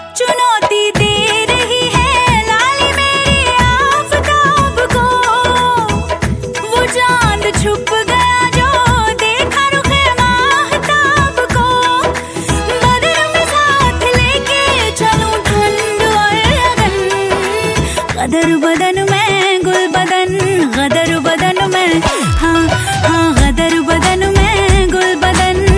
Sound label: New Hindi Item Song Ringtone; ringtones